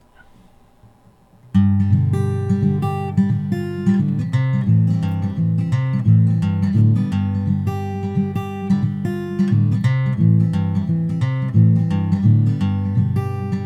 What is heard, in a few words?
Instrumental Long Break; Copper Camp Audio Book